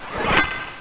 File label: sword; PyOpenAL-0.1.6